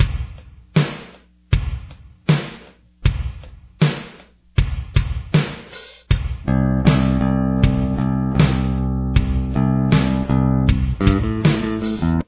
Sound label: intro bass